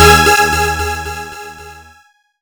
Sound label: Retro Event Echo